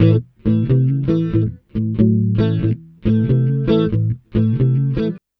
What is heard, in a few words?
BLUES, Acoustic Guitar Loops; USB Soundscan vol.04; AKAI